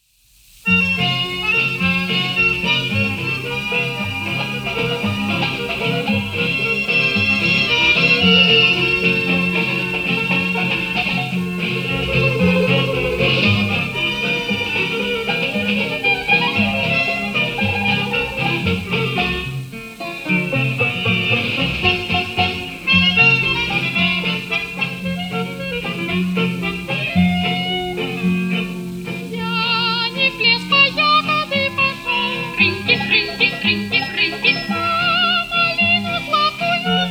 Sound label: Polka; Vokalnoe Trio; Moravskaia